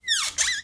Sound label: Creak